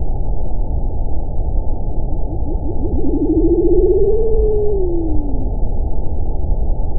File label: zweeoo, Empidonax alnorum; Passeriformes; Tyrannidae